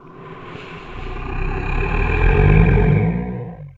SFX, Drum & Bass, 145 BPM; Ueberschall; Drum & Bass Resonance

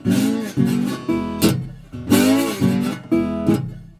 120, Blues Guitar; ILIO - Fretworks

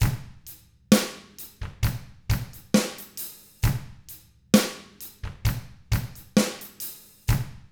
SLOW ROCK, Drums; ILIO; Double Platinum Drums 1

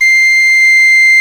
PENNY WHIST; Penny Whistle

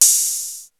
D70 DRUMS; Rubber Chicken Software Sample Bank

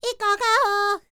Vocal Planet World Voices, NOMADIC

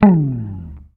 HOFNER, Bass

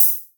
Quasimidi 309, Drums

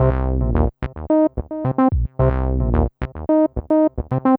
Acid Squelch, Bass; Sonic Foundry (Sony Creative Software); Synchro Funk

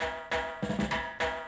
drum loop, 100 BPM

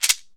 SHEKE, Afro Shakers, Shekeres

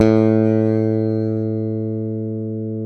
SPEC SUSTAIN, Bass

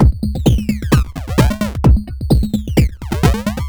Crushed Beat 130BPM; musicradar

